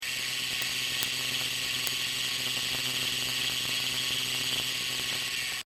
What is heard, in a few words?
gears